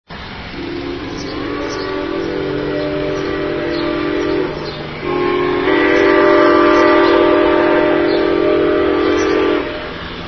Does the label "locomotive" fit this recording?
Yes